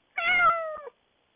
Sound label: cat meow